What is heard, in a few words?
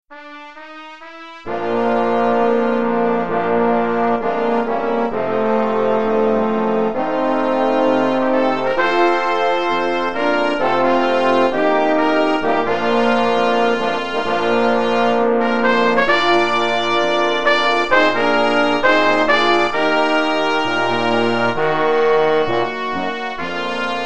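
Trumpets, Horn, Baritone, Trombone; brass quintet; Just A Closer Walk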